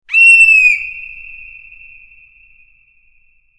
scream real

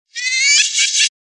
evil laughter reverse real; Halloween

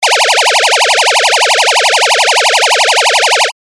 siren emergency piercer

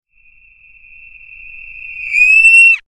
scream reverse real

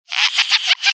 evil laughter reverse real; Halloween

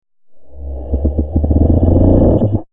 growl evil reverse real; Halloween

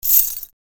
clink bunch keys shaking on keyring real

